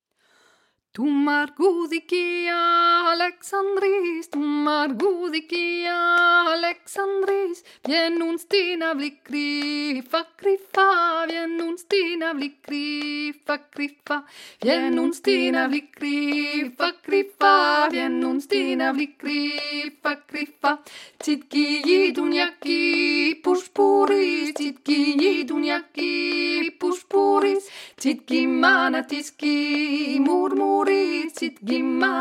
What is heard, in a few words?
Tutti; ALEKSANTRIS